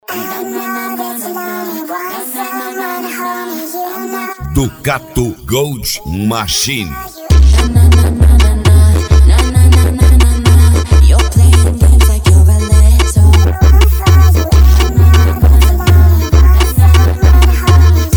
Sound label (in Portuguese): especial funk